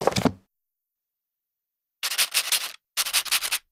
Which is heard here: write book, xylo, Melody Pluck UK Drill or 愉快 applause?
write book